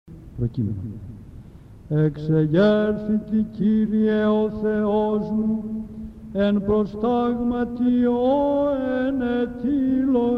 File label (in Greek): ΠΡΟΚΕΙΜΕΝΟΝ, Psaltichie; ΑΝΑΣΤΑΣΙΜΑΤΑΡΙΟ; ΗΧΟΙ